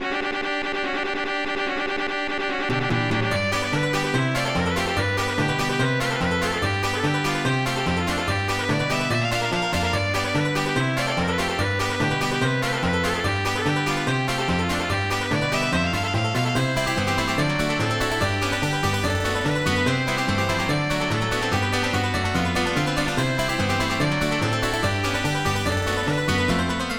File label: fiddle; FF7DEMO